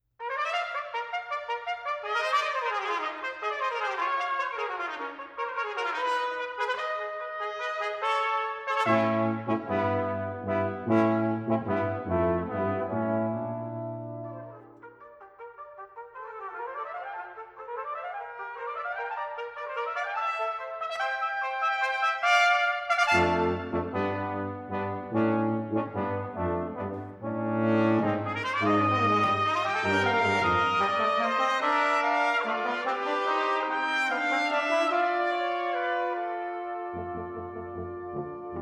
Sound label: Quintet for Brass, Allegro Vivace